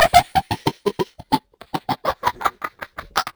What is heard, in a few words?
Evil Laff; Zero-G; Soundclash Nu Dance Electro; Acidized Wavs; FX Atmospheres